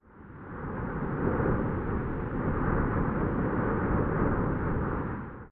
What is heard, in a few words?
ambient battle; World Of Warships